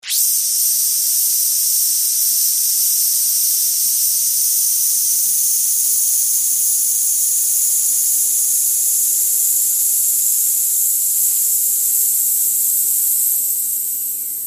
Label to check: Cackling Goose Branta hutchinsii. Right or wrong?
Wrong